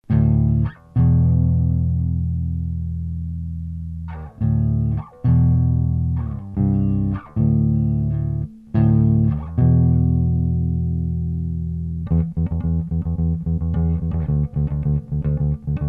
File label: lowdown